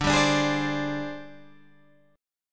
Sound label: G sharp Major 7th Flat 5th